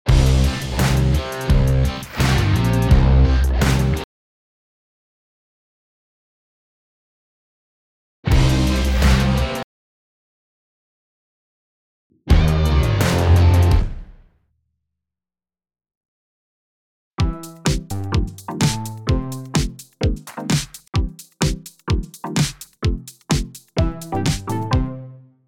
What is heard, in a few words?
Blues